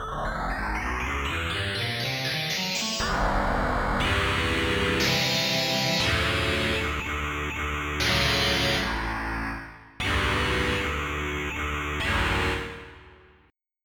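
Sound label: Mondo Wet, fuzz guitars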